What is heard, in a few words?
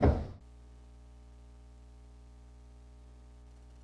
Motor 2, train; nankai6000; OpenBVE